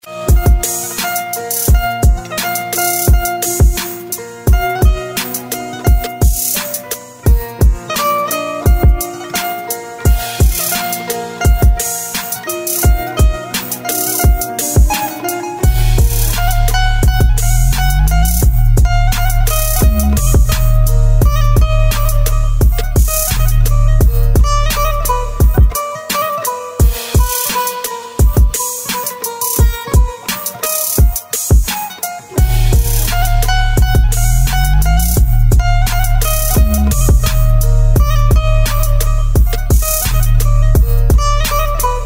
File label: Ringtone